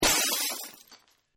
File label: Glass Break Pane, Pane of glass breaks, high pitched; Materials